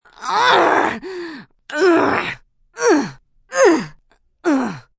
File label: Grunt Long Strain, Grunt, groan, lifting, strain, female, with determination, lifting (human voice); Groaning